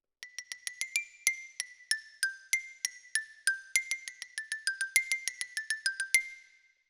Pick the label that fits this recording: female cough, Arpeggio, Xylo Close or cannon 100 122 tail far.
Xylo Close